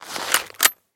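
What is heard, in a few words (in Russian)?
металлический щелчок при перезарядке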